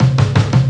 Drum Kit Fill; EXOLINGUISTK; Brooklyn Feels

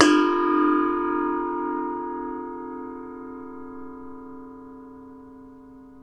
NEPAL CYM, Asian Cymbals

